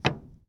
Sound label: Plane P40 Step On Wing; SFX Kit; Airplanes